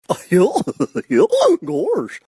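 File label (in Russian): смех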